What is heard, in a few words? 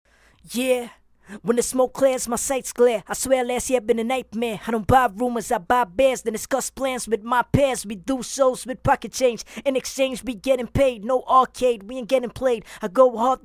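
hip hop 75 B minor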